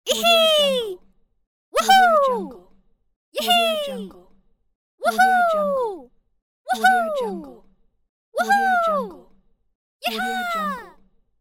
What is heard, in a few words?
girl leaping pack